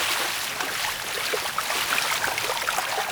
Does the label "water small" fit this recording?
Yes